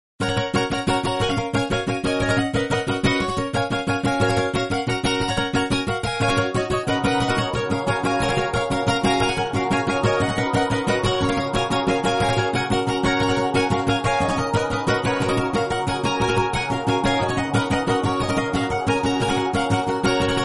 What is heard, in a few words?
guitar amazing; iPhone